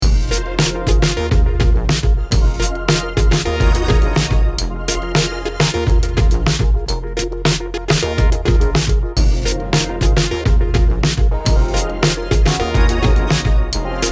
Neo Lofi Beats

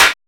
Clap

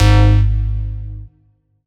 synth one shot serum clean stab G

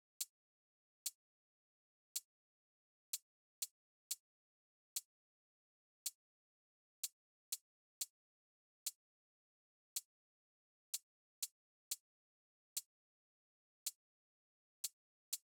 Hhats punchy 123BPM Fmaj Light; viby

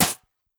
snare layer

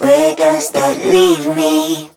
110 Vocal Group Loop Abm

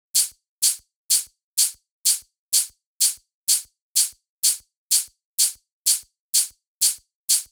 Hats 126

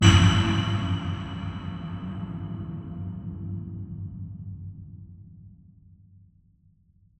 Cinematic Metal

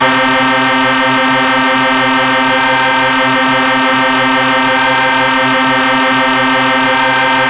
C4 True Saw Synth

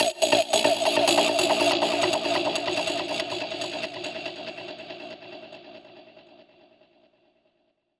140 A Synth

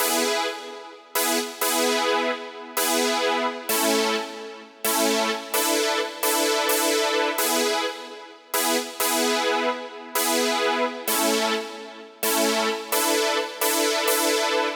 Synth Chords Loop 130bpm A

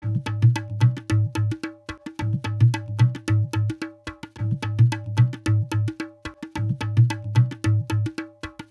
Dholak, 110, D

